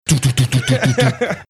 making concussive sounds

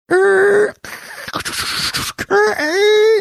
making modem sounds

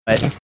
snorting

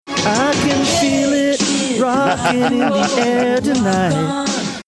singing along rock on

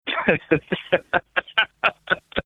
laughing

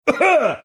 mimicking cough

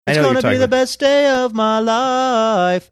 singing it's gonna be

